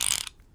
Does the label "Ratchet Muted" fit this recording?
Yes